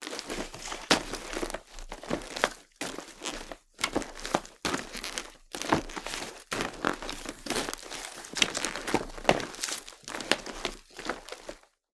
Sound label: Footsteps Male - Boots Cardboard Pile